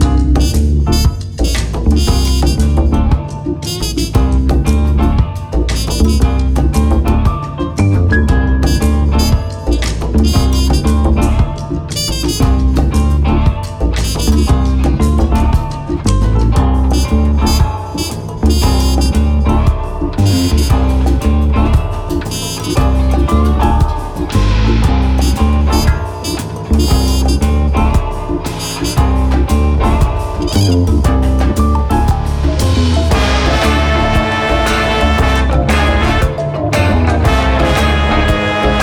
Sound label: encounter detective; Manamon Music; Manamon 2